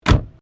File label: CAR HOLDEN HD PREMIER DOOR CLOSE, Classic Australian cars; 1965; Vehicles; SoundEffects-Foundation1